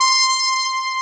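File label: muted trump C6, muted trumpet; Star Trek JR Audio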